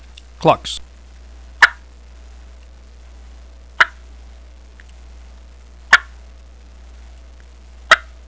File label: true double clucks; primos